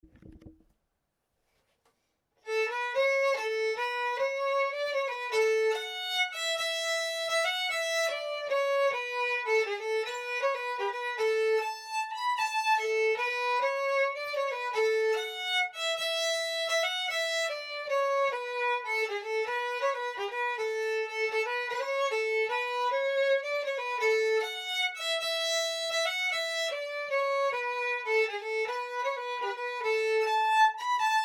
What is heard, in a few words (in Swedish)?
Vals; eft Kristian Oskarsson